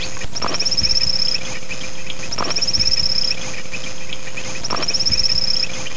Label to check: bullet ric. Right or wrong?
Wrong